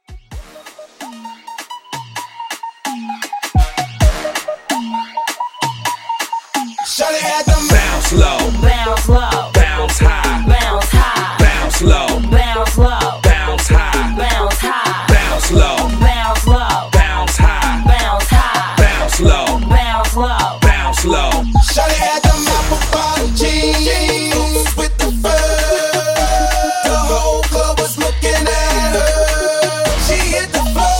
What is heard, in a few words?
Low Afro Hype Bootleg 130; remixplanet